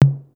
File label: tom; foxdot default